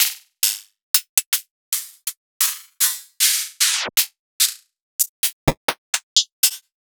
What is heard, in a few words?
HiHats; ghosthack